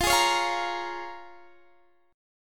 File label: Bb Minor 7th, guitar